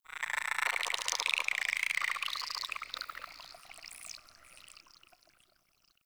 Tunnel Critter; Warframe; Tau

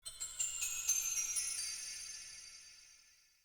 cave mysterious distant; Ambience; Shrine Cave; Midrath Island

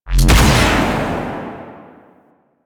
Alt Shot, Blastor Pistol; Warframe; Weapons; Corpus; Plinx

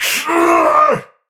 Pain Heavy; Tau; Dax; Battle Barks

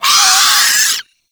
Arm Vocal Wind Up; Enemies; Warframe; ManInTheWall

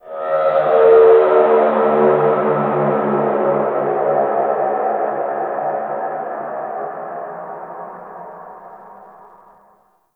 Landscape Ambient Texture

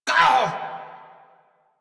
Pain, en; Warframe; Corpus Aristocrat Manager; Treasurer; Battle Barks

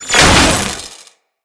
Security Camera Destroyed

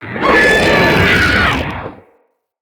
Spider Mother Vocal Death; Vocals; Root Spider Mother

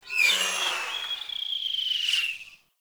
Bow Projectile

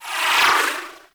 Space Fighter Dredger Rocket Proj Whizz By; Grineer

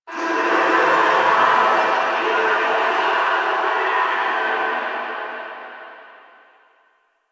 Distant Sewer Grate Screams; Infested; Warframe; Ambience; Nineteen Ninety Nine

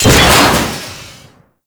Storage Container Cylinder Smash; Warframe

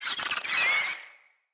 Infested Ashen Vocal Attack Death; Enemies

